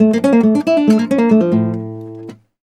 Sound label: Flamenco, Guitar, a